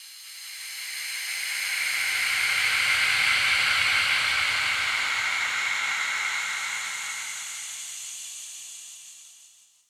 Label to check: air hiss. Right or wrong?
Right